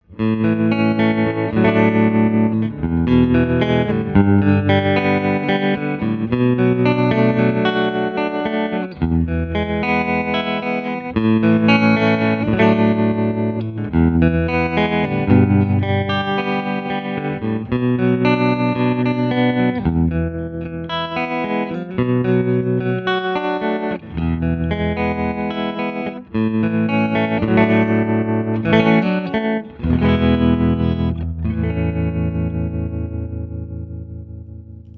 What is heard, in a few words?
deluxe strat